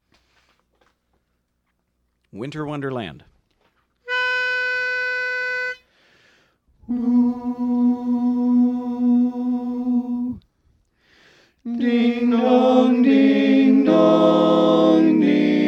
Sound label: tenor; Christmas